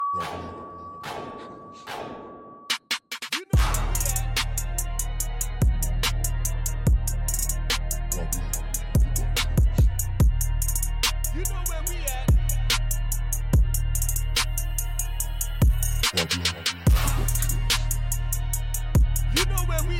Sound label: Drill